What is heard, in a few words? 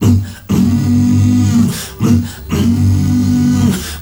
male hum, Heart of Africa; Spectrasonics